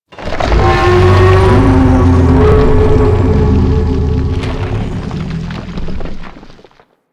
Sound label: Regrowth Orb Chatter; Props; Warframe; Ambience; Sentient Devourer